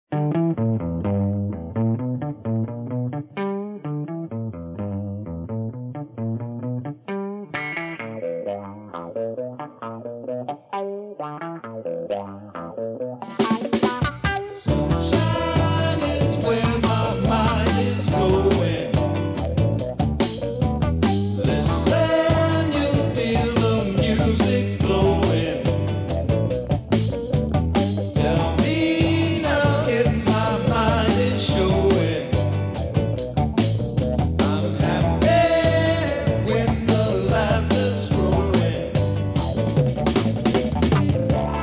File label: Groove